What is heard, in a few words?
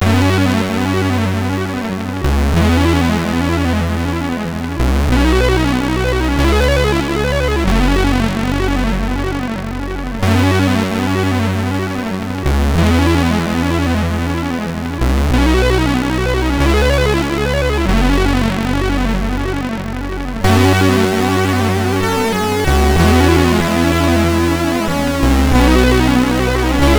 MOS6581; MOS6581R4